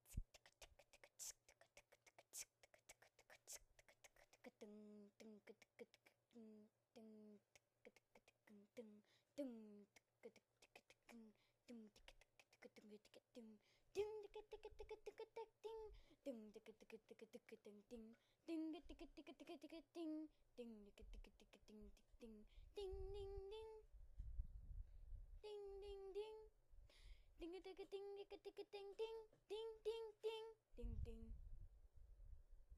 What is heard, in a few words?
ping pong